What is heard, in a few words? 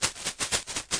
Thermal Shaker Found Percussion